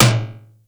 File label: METALIC TOM; PLASTIC DRUM; Crypton - DrumBase5000